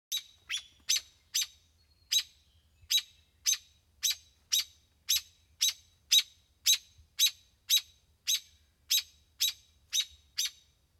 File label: Robin American alarm; birdsong